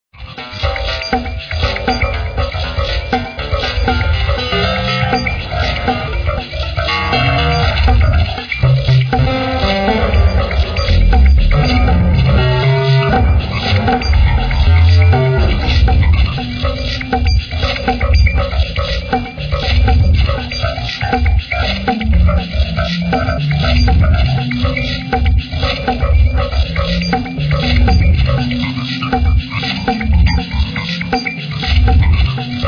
Industrial Groove

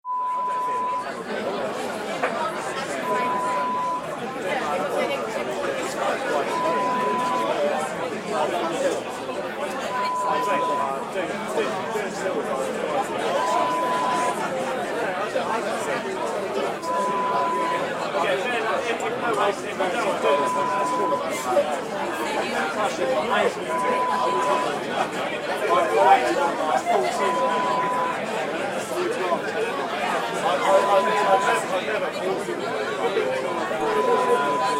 Garden Party sound

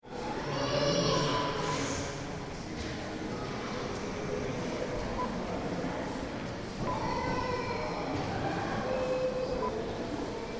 Art Gallery Atmos

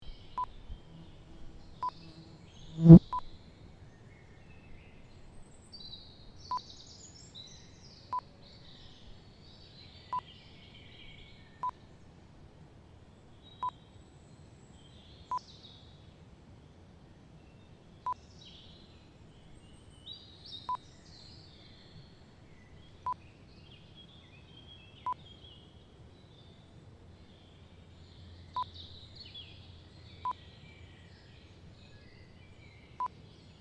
Conifer Woodland Spring

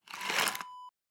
candy box tilt

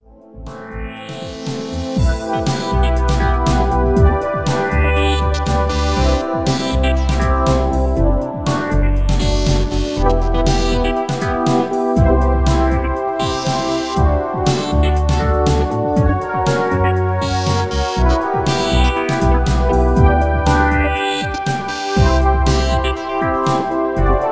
Electro Ambient Loop

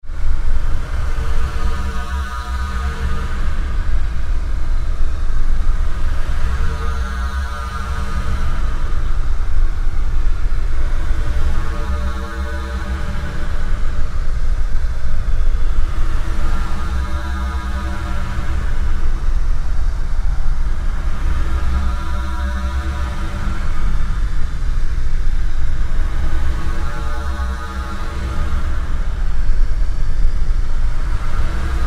Sci-Fi SFX

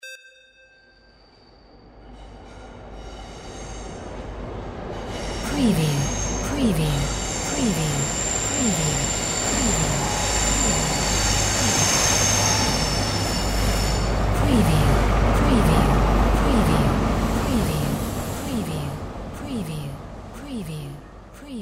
TRAIN BREAKS ENGINE PASSBY WALLA; windy beach audio

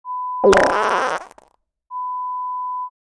Fart sound

